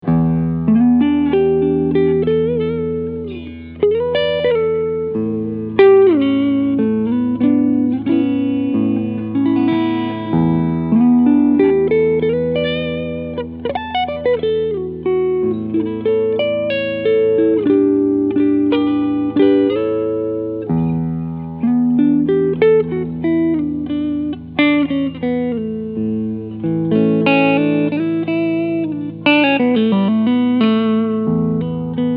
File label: PRS SE Mira Cleansound Neck Pickup